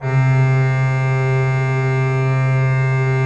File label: C2, Cathedral Organ, HOLZGEDAKT; Propeller Island